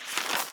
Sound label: rotate